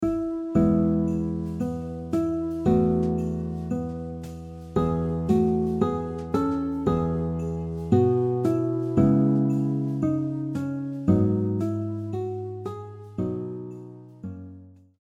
guitar; O Come All Ye Faithful; christmas songs

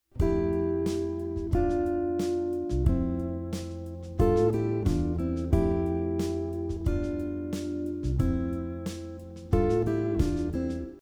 3rd above melody example, guitar; how to harmonize a melody on guitar using 3rds and 6ths

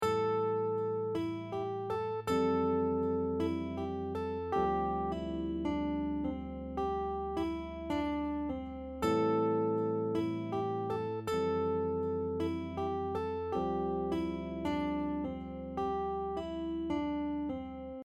Pentatonic example, guitar

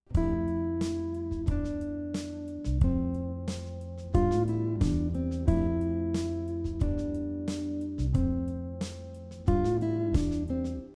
Original melody 3rd and 6ths, guitar; how to harmonize a melody on guitar using 3rds and 6ths

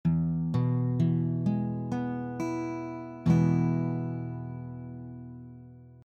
E Major, guitar chords; E Major chord basic; master the basic chords on guitar a beginners guide

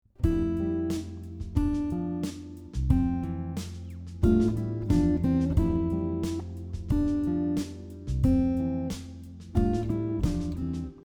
Variation example, guitar; how to harmonize a melody on guitar using 3rds and 6ths

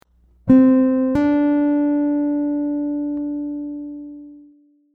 hammer on example, guitar; hammer ons and pull offs on guitar

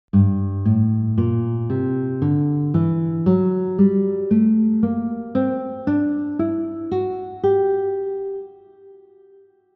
G Melodic minor scale, guitar

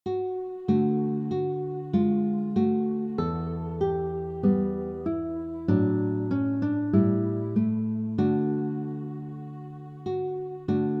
guitar; O Little Town of Bethlehem; christmas songs

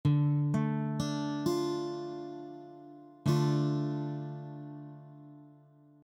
D minor, guitar chord; D minor chord basic; master the basic chords on guitar a beginners guide

